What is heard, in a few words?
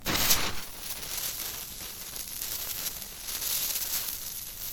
fuse